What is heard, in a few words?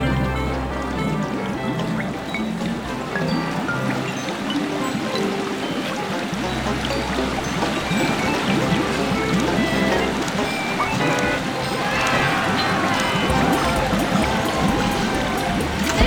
Wolf Loop; Real Deal Slots; Lobster Larry; SlotsOL